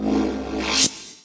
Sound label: Electro FX; Ueb HE Electro FX